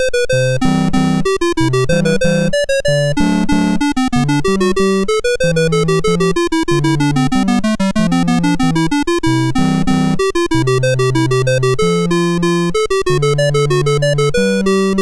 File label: Smuggler, MOS6581R3